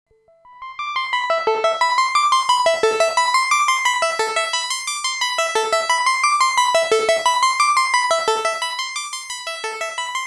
LXP 1 Juno 60 Arp SMALL1 Dec 4 Del 4

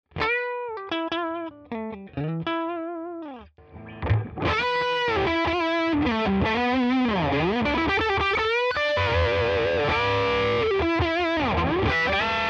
DRIVE Crunch Lead; Walrus Fundamental

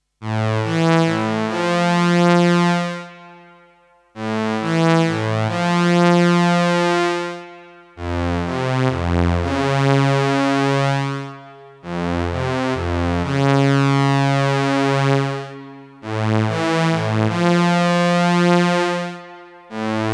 Detune Cello SS-30

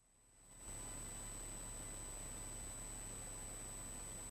presonus audiobox go noise

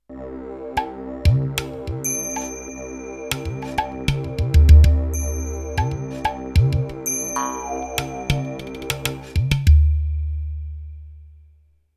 Octapad

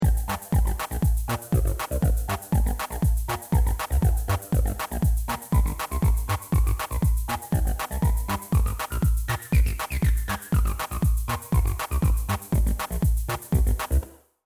TD-3 Audio Pattern

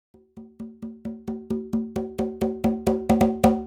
Conga Velocity

